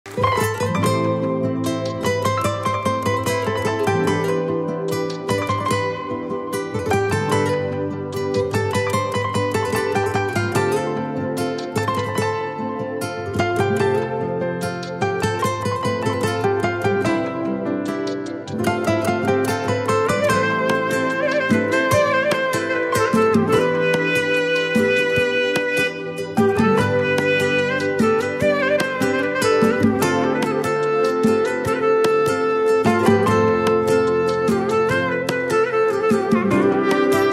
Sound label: concert